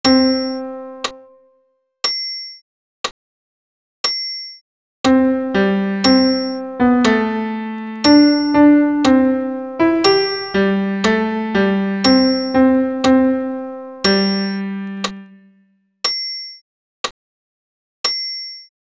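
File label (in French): Et in spiritum alto piano seul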